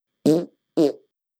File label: fart